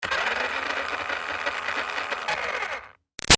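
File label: Toy Bounce Back Racer close up Run Short; Toy Cars; Toys; Big Room Sound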